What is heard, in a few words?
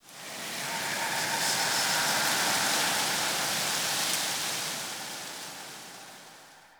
Day Wind Gust Calm; Ambience; One Offs; Glades Of Andurin